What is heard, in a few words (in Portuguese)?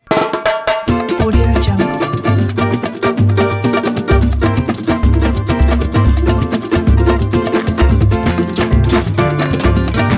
sambao de quintal; flaviocortizo